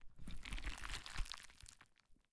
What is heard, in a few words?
gather meat